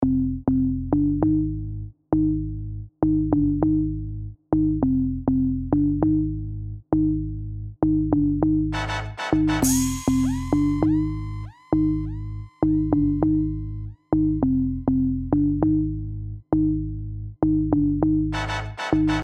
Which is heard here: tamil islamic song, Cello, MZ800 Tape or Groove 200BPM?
Groove 200BPM